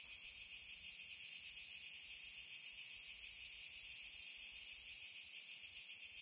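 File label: insects loop; video game; source engine; portal2; ambient; nature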